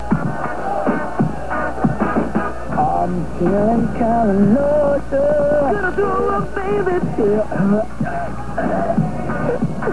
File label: throwup